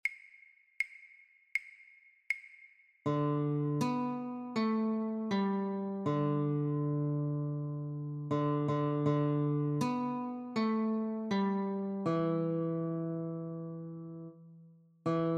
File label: Jingle Bells Notes, guitar christmas